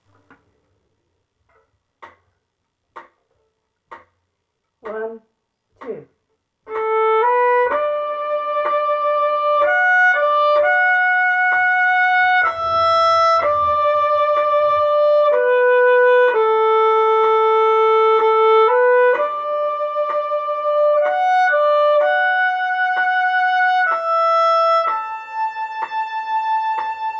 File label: Violin Amazing Grace 2 times